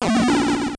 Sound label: raccoon transform; super mario bros 3 nes